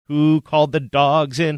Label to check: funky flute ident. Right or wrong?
Wrong